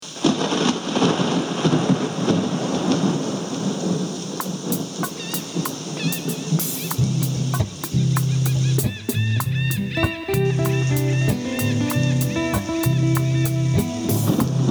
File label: Tropical Rain